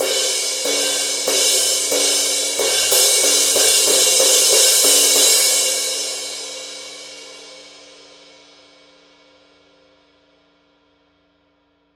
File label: wild crash pattern; paiste; rude